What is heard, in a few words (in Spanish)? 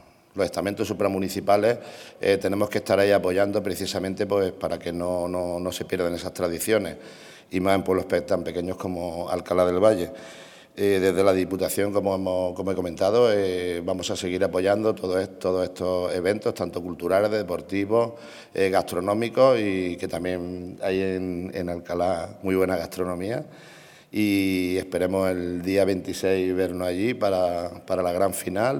flamenco; Concurso de Jovenes Flamencos de Alcala del Valle